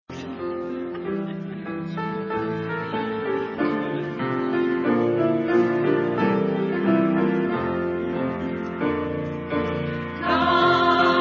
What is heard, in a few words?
choir